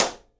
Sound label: SIDE STICK, Live Snares